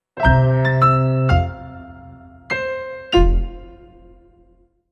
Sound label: Happy Hodge Podge, Sting 1